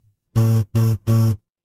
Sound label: feedback error